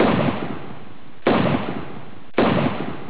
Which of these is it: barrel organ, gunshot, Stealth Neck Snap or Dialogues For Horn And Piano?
gunshot